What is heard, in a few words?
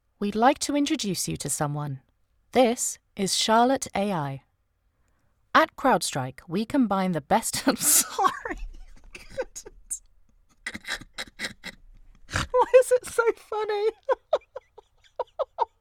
Laugh